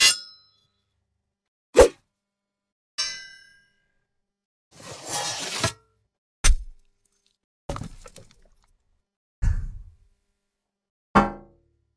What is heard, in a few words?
weapons essentials, combat